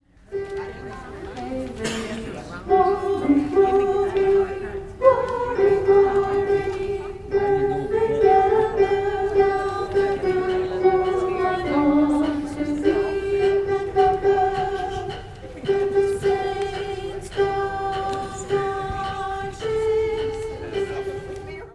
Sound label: altos at Glory Glory